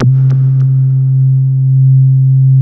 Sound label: WALL MOOG, Synthy Tonal; Distored Reality